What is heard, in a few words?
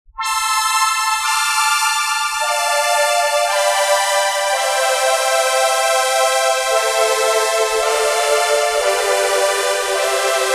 Atom Brass